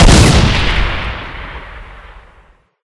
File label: impact, turret; gmod; lvs star wars